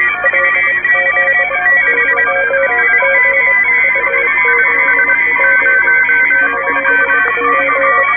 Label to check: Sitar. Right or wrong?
Wrong